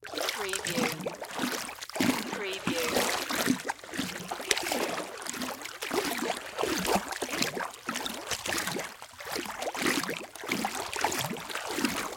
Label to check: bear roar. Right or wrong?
Wrong